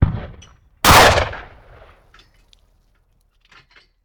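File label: Cannon Cannonball; Sound Ideas; Guns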